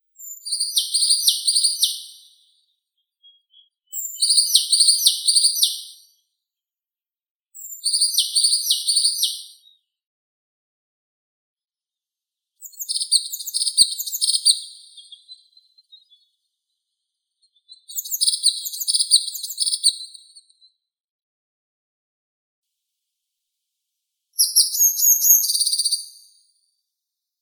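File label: azure tit Cyanistes cyanus, nature sounds; lazorevka belaya; knyazek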